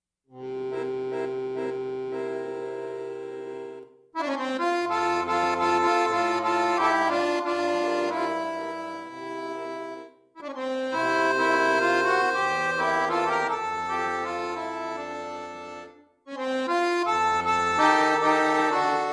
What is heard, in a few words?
Celtic Irish, Accordion